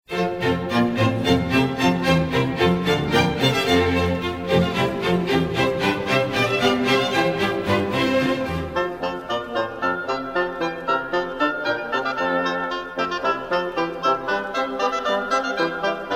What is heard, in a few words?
Water Music Bourree; Georg-Friedrich Haendel